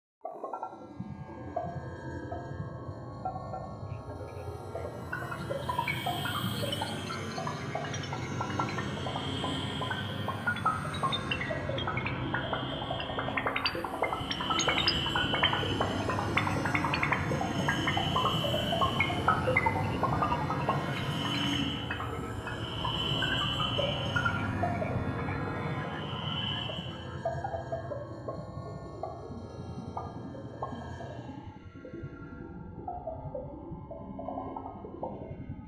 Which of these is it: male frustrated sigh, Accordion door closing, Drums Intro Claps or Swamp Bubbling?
Swamp Bubbling